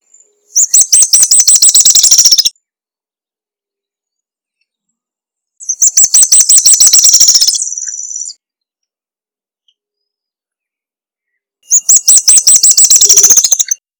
trepadorcito

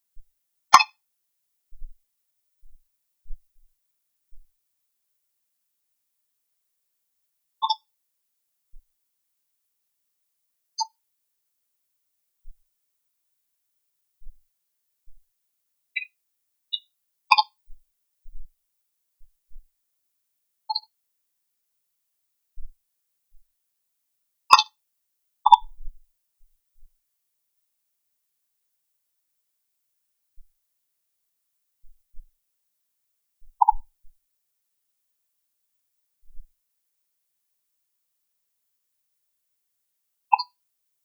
Yabiru

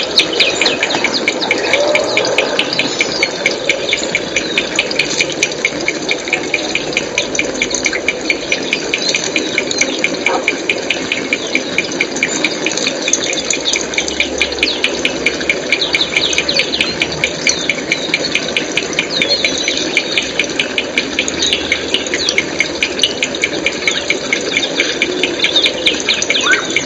Burrito enano, Coturnicops notatus

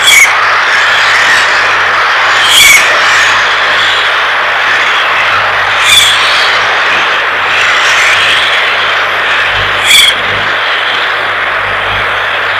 gaviotin real

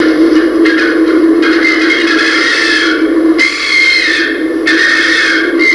gaviota capucha café

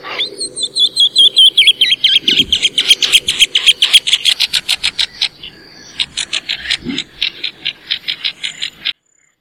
martin pescador mediano

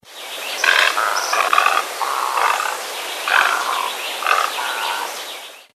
Garza blanca grande

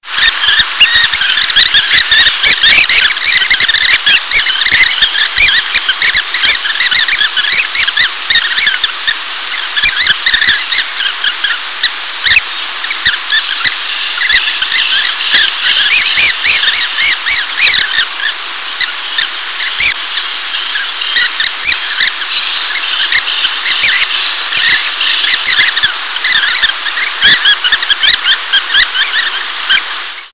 falaropo pico grueso

